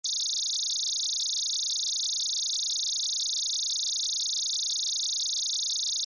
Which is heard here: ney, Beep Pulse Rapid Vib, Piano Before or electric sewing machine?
Beep Pulse Rapid Vib